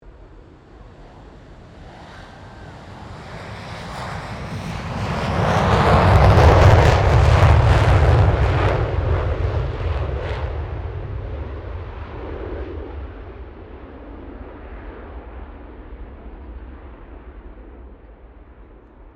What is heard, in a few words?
AIRCRAFT FA-18 HORNET TAKEOFF STEREO; RAAF FA-18 Hornet; Airplanes (Sound Effects:Vehicles)